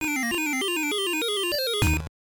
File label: Jingle; The Jungle Book; Game Boy